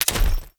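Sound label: UI select item; Veilbreaker; Kahl Customization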